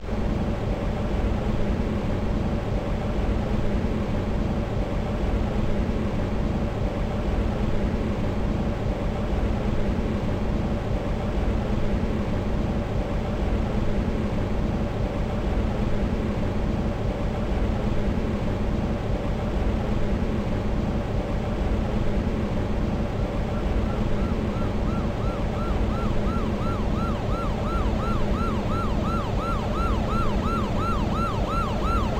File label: City Ambience; max payne gba gamerip 2003